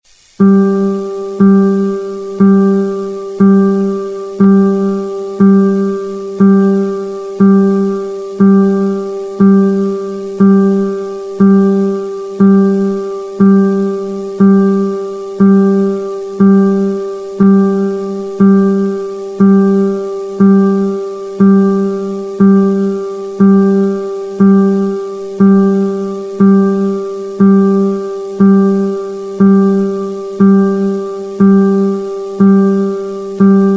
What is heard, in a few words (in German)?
Gitarre Saite g